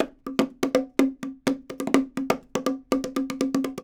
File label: ghana bongo loop, 125